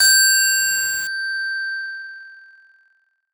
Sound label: percussion bell G; Percs; bell boi